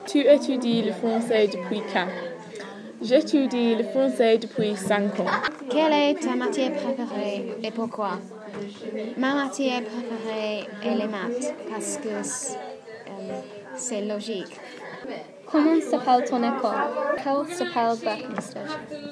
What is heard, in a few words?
french lolz